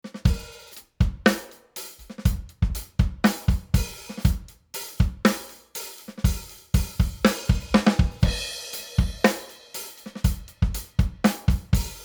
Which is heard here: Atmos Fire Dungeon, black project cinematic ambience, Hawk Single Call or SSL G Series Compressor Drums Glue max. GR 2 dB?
SSL G Series Compressor Drums Glue max. GR 2 dB